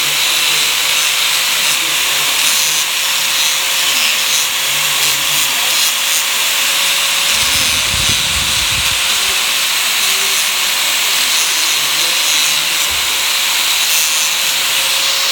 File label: Angle grinding